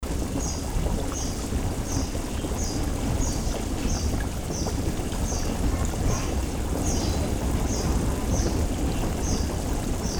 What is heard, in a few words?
fire water mono; guanziling